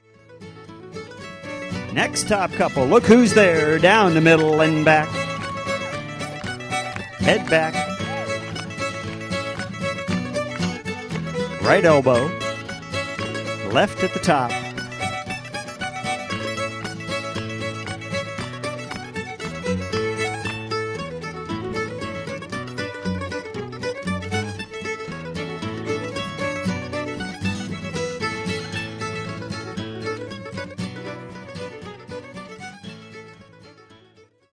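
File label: String Band Country Dance with Masons Apron; Bowdoinham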